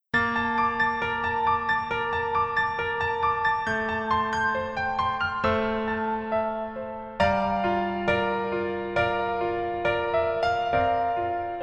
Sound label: Piano ver